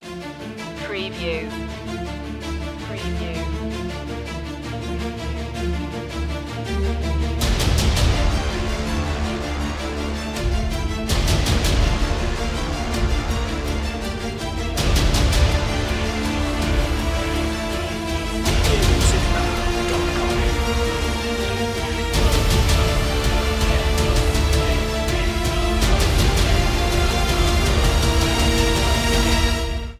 battlefield, Orchestral Soundtrack Epic Themes Battlefield Epic Trailer, Massive Percussion, String Ostinat